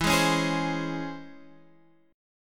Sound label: C sharp Minor Major 7th, chord